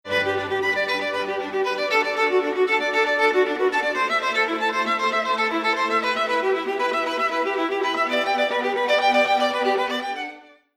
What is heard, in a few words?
string; Instruments of the Orchestra